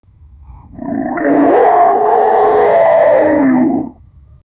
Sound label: bears roar